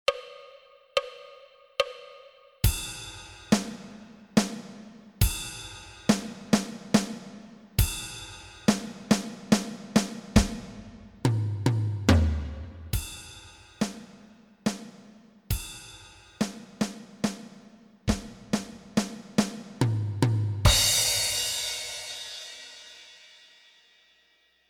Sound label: Waltzer 70